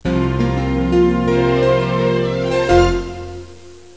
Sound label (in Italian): suonerie musica jazz